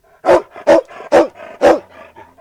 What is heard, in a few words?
Bark; gcompris; memory-sound